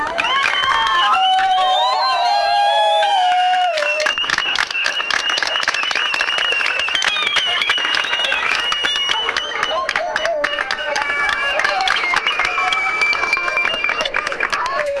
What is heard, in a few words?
create the sound of a, applause